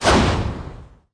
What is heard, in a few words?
Kick Heavy; sanji